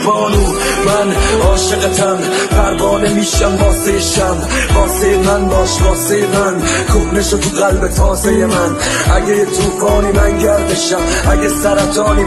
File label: Remix